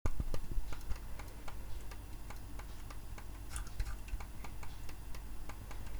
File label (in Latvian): Logitech M340 datorpele